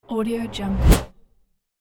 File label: whoosh